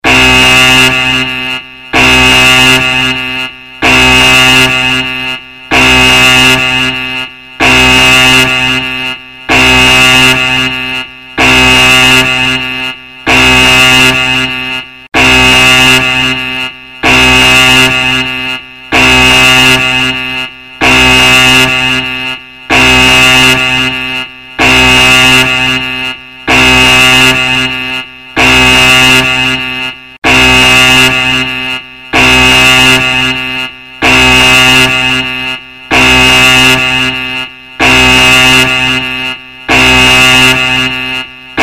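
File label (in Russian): razdraj trevoga, alarm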